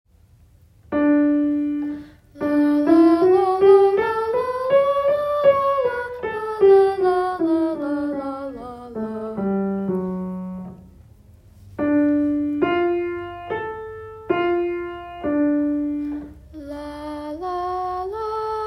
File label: soprano; Audition